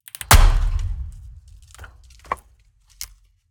metal hit crash; fvtt sonniss gdc audio

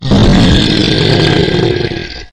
growl, monsters; Creatures

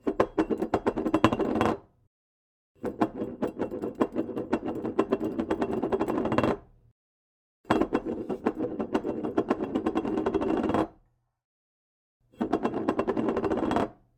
mug kitchen counter spins top series; sonniss gdc

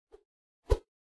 melee attack swoosh light; psfx; weapon swooshes

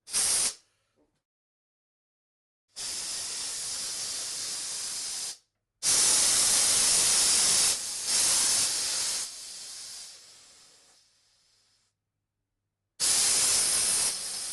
tugboat moisture drain air release steady various; mix rsm191; sonniss gdc

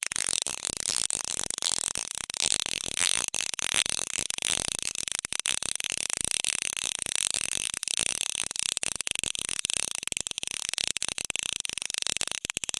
small gear plastic fast; sonniss gdc

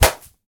punch blocked; sonniss gdc

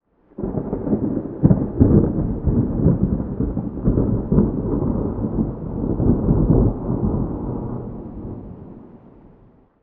call lightning, cast; psfx; 3rd level spells

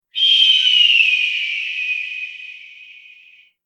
hawk call; weather and nature

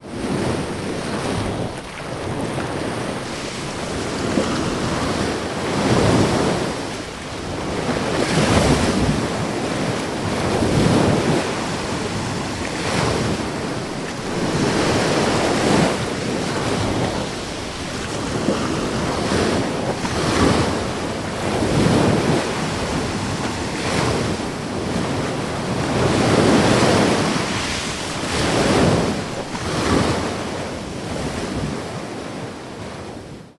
ambience ocean waves; sonniss gdc

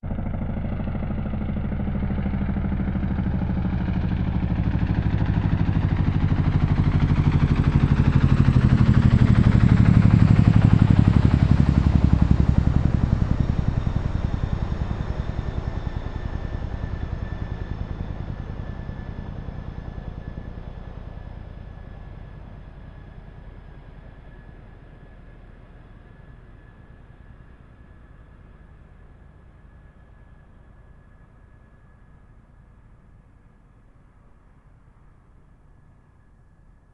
helicopter flyby close chinook; fvtt sonniss gdc audio

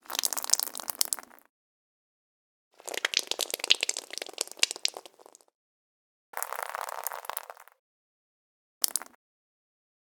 blood drips; sonniss gdc

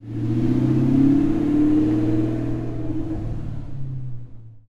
old car short passby; sonniss gdc

